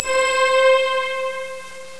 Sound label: Flange Medium; Calculator 101 v1.1